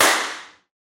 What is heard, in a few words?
bullet impact rock